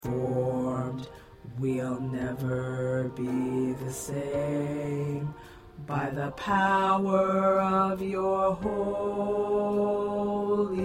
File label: Bass; gospel songs